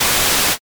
mosaic

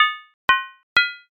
armor

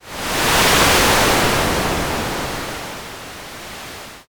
wind

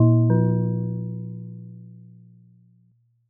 china gong

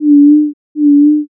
owl

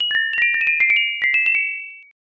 xmas bell

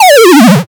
powerdown

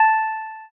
piano

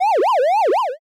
spin

